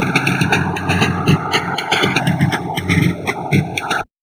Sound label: DIST, Drum Loops Crazy Processed